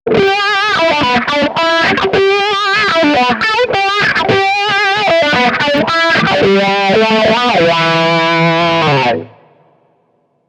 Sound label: Wah; wahwah